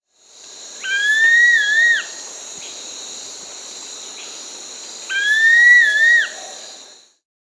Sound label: Rufous-tailed Flatbill (Ramphotrigon ruficauda), Peru; S. America